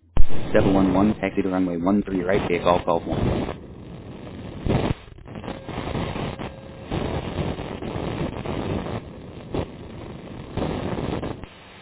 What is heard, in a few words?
taxi